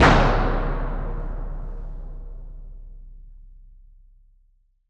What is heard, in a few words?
bang, space junk; Spectrasonic Distorted Reality 2